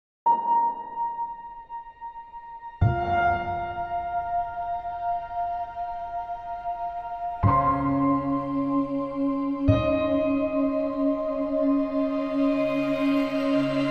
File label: Piano and Pads